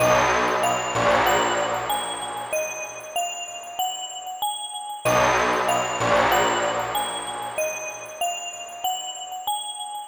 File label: Stabs Serious Tension D# 95